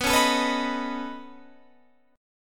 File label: Db 7th Suspended 2nd Sharp 5th